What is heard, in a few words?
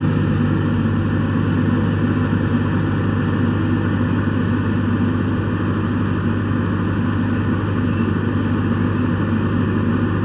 Generator, Air Conditioning, Motor, Hum, Circus Ambiences; MACHFan; Jake Fielding